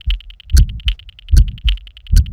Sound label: adrenaline loop